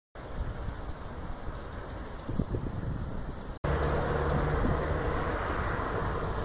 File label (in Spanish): Coludito Copeton, Leptasthenura platensis; Rabudinho (Leptasthenura platensis); EcoRegistros